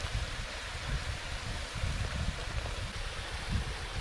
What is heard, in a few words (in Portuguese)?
Rio Gualaxo do Norte